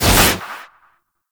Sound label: Cloak Pulse End; Powers; Warframe; Focus; Operators